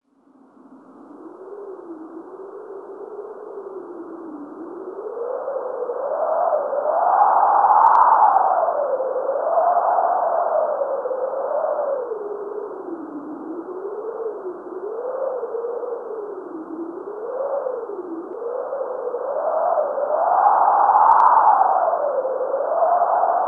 atmo, atmosph, special fx twisted

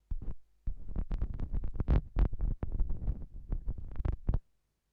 Turntablism; Sonokinetic - The Roots Drumloops

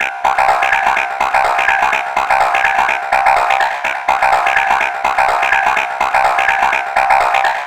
SYN 125, House synth loops

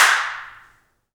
CLP HNDCL, Handclaps; Roland LCDP14 Africa VOL-2; Afr. Hands

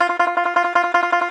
T. BANJO, Tenor Banjo, Plucked Strings

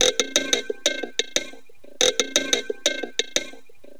Ratchet Tabla Beat, Electronic Beats; Sonic Foundry (Sony Creative Software); Myths of Technology

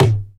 tom, dance drums

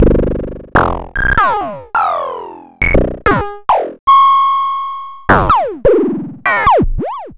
Amdek PCK-100

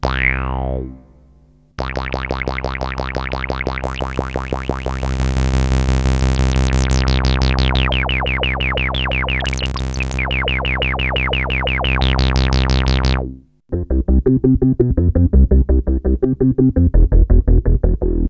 Korg Prophecy hits, arps and fx

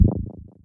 Stabs, Elektron MachineDrum; SPS1 MKII

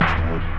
Clap Tone; Lost Tapes From Mars; Clap & Rim

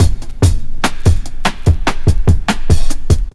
TRIP 90, trip loop

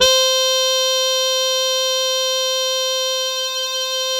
C5, Synth Line

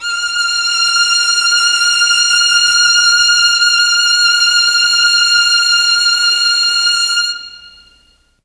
F4 VLNS, Mellotron; CHMB 3 VLNS; Mike Pinder - Mellotron Archive CD

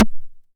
909 Rim Tape Sat; Goldbaby Tape808 & Tape909; The Tape 909

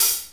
ohh; jazz jackrabbit 2

